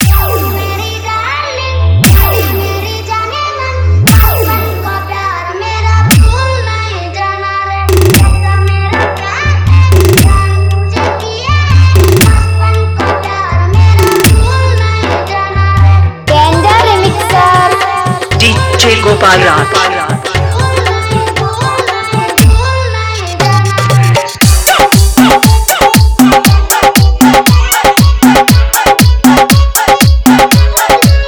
Hard Dholki Remix Song

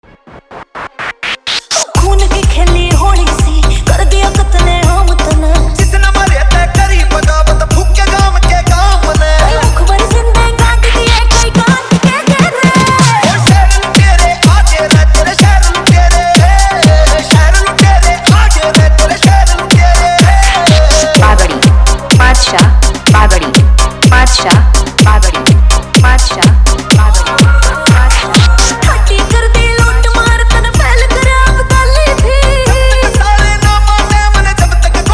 Hard Punch Remix

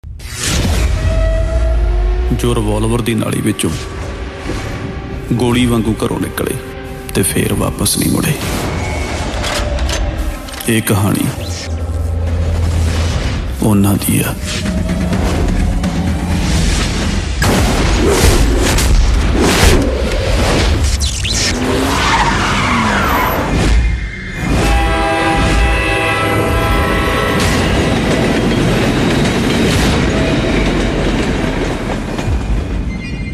New Punjabi Dj Song

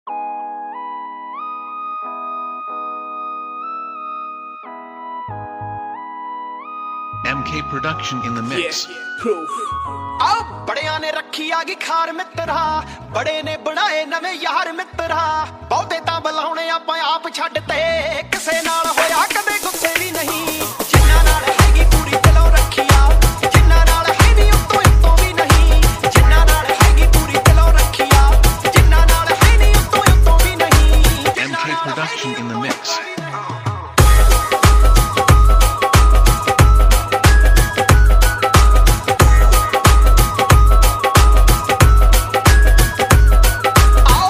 Dhol Remix Song

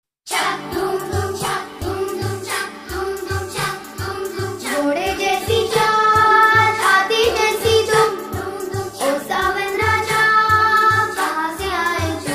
8D Song, New Hindi 8D Song; Dil To Pagal Hai Movie

